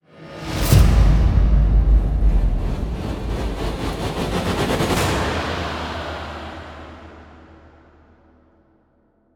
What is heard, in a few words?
Stinger Modulate ramp; extinction deluxe edition; ps4 windows xbox one